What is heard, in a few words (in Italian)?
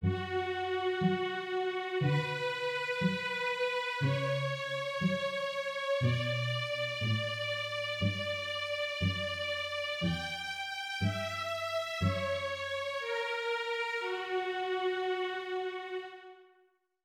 Andante forse per una sinfonia